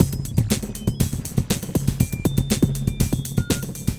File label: ELECTRO ACOUSTIC KIT MELT, PROCESSED ACOUSTIC DRUM KIT; Sonic Foundry (Sony Creative Software); Vir Unis Infusion